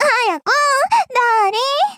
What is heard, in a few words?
combo break